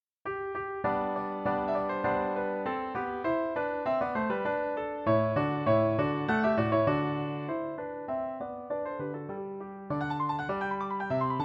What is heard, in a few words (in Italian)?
Lied per Canto e Pianoforte. Allegro; Koehler; Ein kleines Unrecht